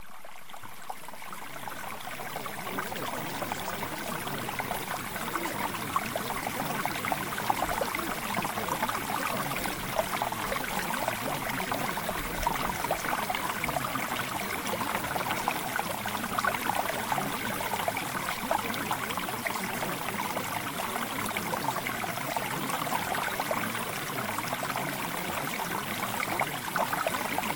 Mountain Stream; Spiritual

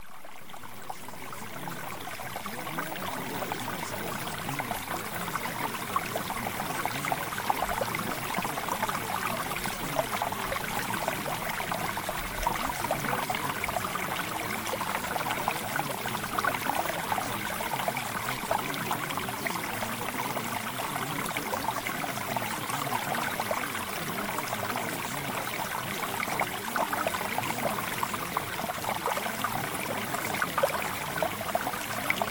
Mountain Stream Sample

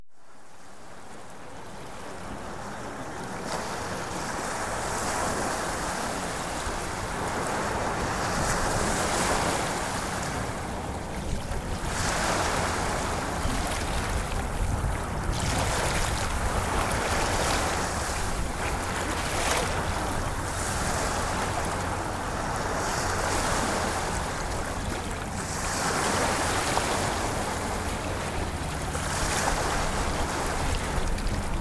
Ocean Waves

